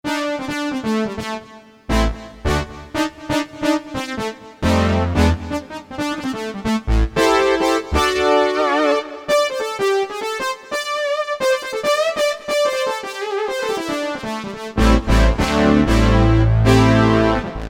Brass, Korg DSS-1 DWGS synthesizer; Korg DSS-1 Sound Library

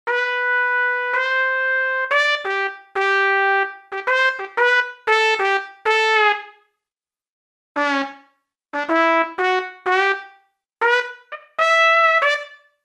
Trumpet, Roland JV-880; Factory Patches

